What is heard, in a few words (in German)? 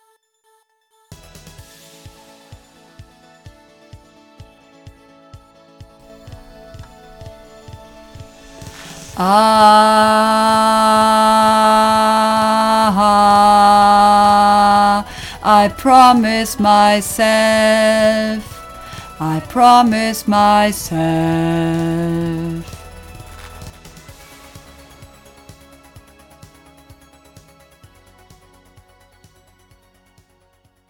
Bass Nur Bridge